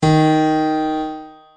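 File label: D sharp 3 piano; notes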